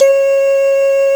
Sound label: Doo Eb3